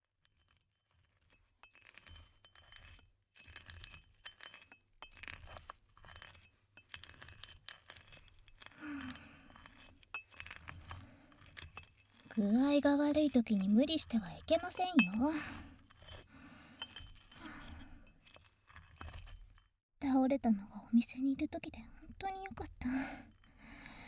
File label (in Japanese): 薬研 の 音; 音喫茶ねころび; 堪能コース